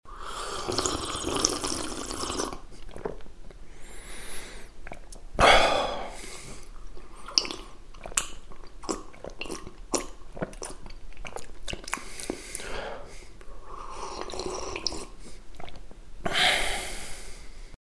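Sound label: sound of drinking tea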